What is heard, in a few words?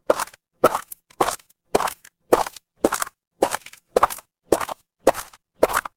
footsteps gravel